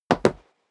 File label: step sneaker wood parquet jump; schritte